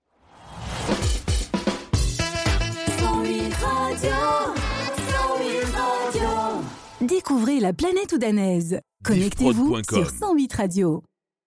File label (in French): Radio Jingle Chante Funky Avec parle